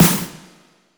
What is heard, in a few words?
Snare; Takebeat; Club; VEC1